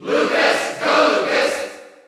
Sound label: Cheer Dutch; Lucas; SSBU